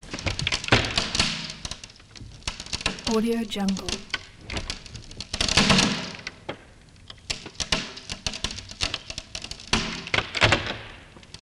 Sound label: wood crack creaking splintering scraping; prosoundfx